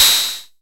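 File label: MS20 HAT OPEN; INIS - Funky Xtreams; MS-20 KIT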